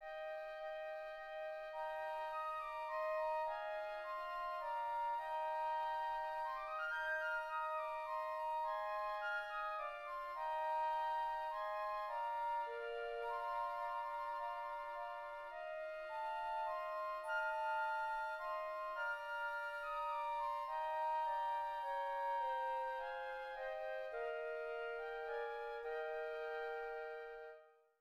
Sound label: flutes; Airforce